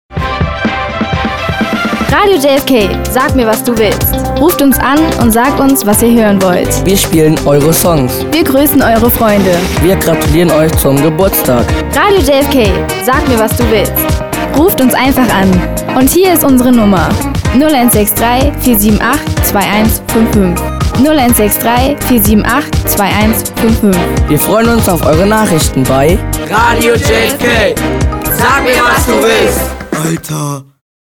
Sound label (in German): Radio Hörer Jingle; JFK; wirvonderkennedy